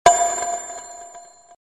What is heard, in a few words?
Awkward hit meme